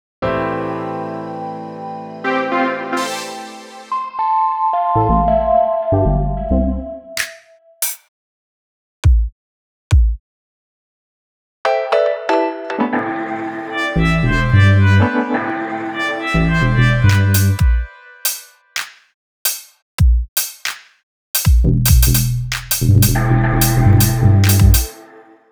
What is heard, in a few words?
Downtempo House